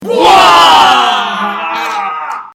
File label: buaaaaa